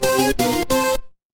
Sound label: retro game game over